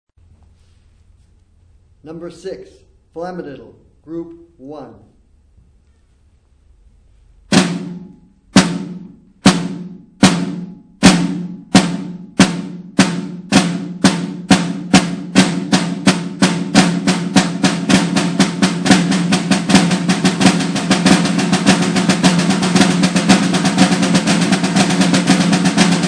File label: snare rudiment